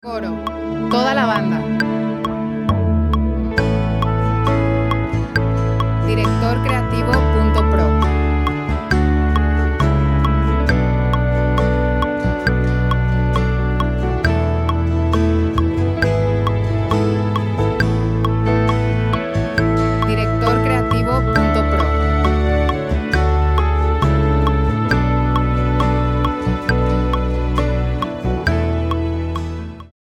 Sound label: 67.5bpm 4 4 A